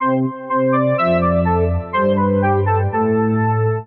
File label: Organ (C) 124bpm; Music Loops; St. Krillion Organ; Ableton; Factory Packs; Singularities